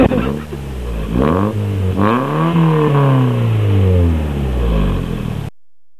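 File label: 3SFE, muffler, TOYOTA CURREN; FUJITSUBO レガリスＲ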